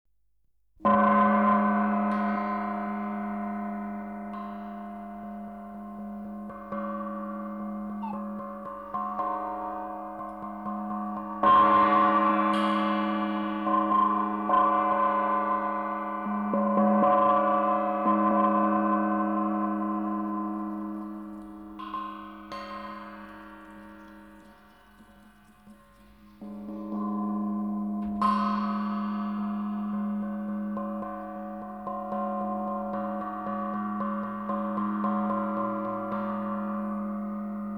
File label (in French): cymbale percutee